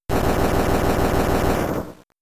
Magnitude; Pokemon; Gen 3; attack moves; RSE

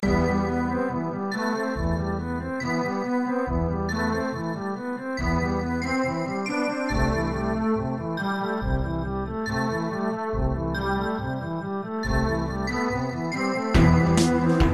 Dungeon

